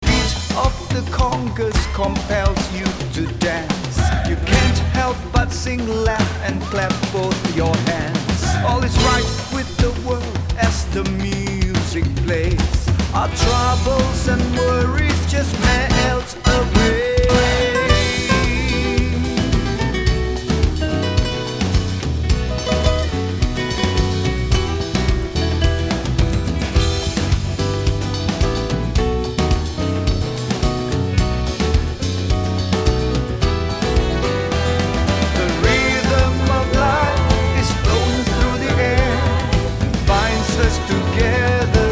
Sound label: Latin Pop Song